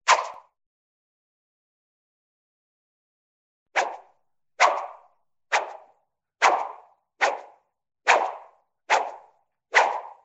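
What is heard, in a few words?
cartoon swishes fast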